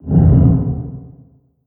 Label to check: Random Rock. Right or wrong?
Right